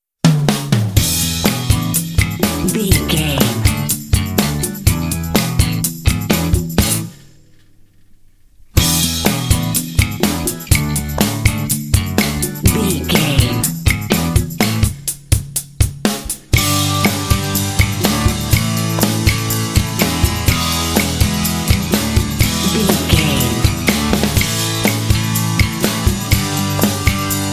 indie pop jams no electric guitar

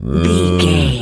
cow moo

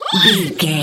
goblin fairy attack

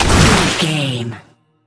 water blast projectile spell

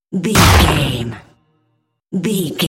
cinematic stab hit trailer

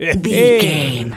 voice fun man character deep laugh

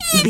mouse emote

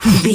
voice male b effort quick action

